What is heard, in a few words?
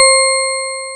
ORGAN WAVE